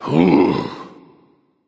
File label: sigh; smite; voicepack